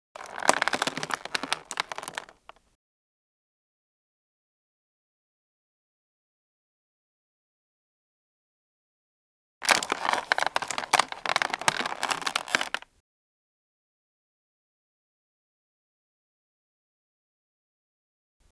pole creak, ambient

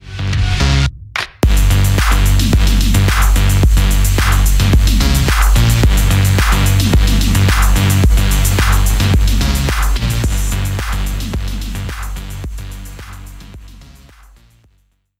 viol

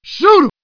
fire; css; hideandseek

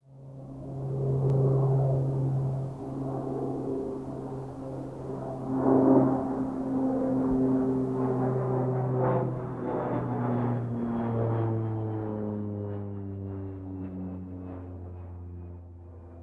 airplane, ambient

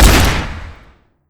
peacemaker shoot; weapons

